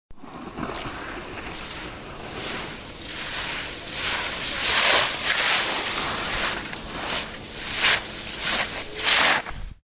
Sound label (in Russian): zvuk skolzhenija na virazhah snachala dva lyzhnika potom tretij